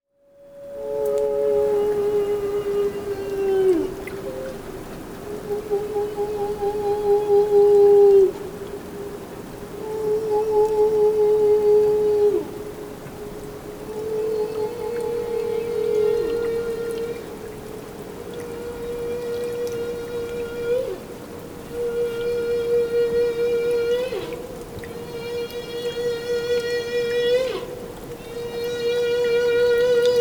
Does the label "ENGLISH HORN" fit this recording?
No